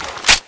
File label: dual kriss clip in